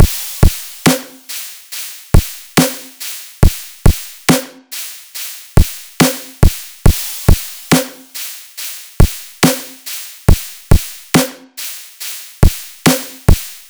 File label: Drum Loop 140; embers; Glow